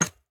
footstep; smite; osiris

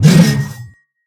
swing; smite; chaac

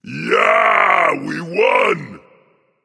nv yay; Smite; ymir skin14

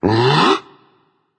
breath berserk; cuchulainn

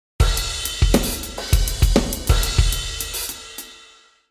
defeat drums; smite; raijin

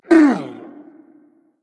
hitreact human male large; smite; npc; roman archer

